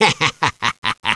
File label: laugh